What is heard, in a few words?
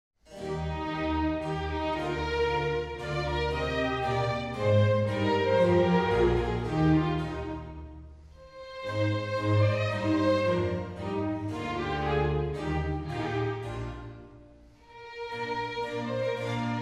Concerto in E Flat Major for Horn and String Orchestra, Tempo di Menuetto; Baroque.horn